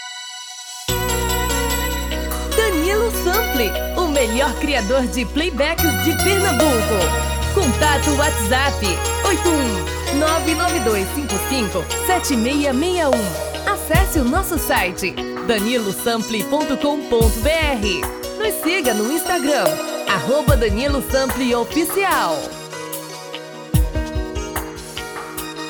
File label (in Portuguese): brega